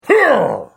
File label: Hit